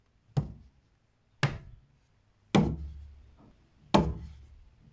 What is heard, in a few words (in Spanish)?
golpe caida